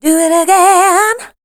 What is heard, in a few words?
voice spectral, ly short